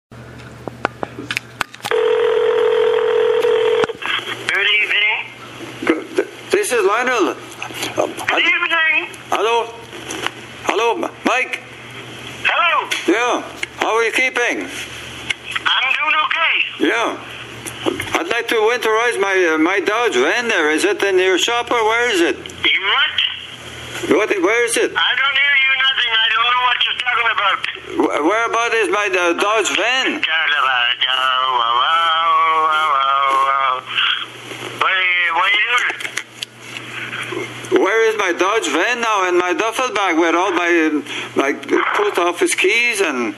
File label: singing